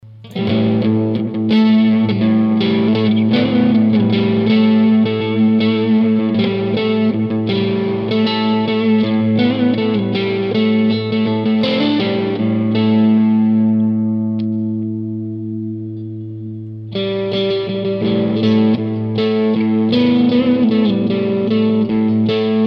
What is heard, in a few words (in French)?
5 positions crunch echo